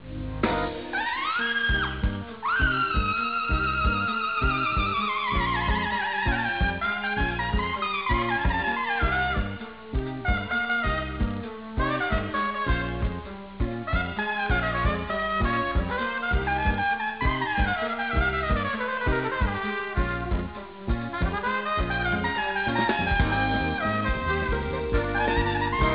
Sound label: trumpet